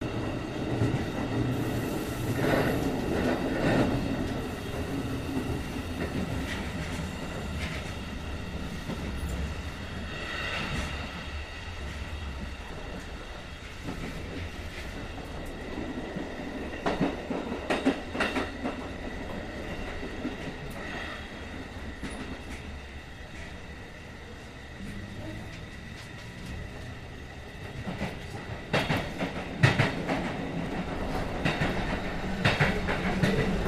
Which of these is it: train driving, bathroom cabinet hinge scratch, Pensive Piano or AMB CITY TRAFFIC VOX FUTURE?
train driving